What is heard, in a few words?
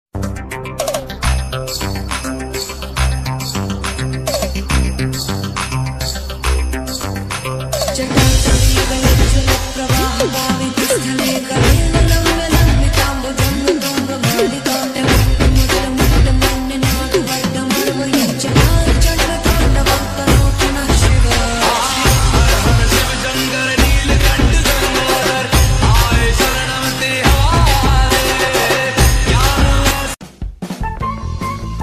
om namah shivaya ringtone